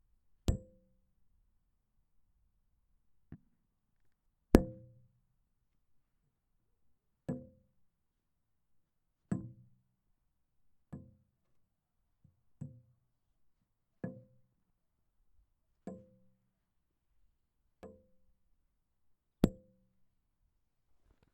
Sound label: Hits Metal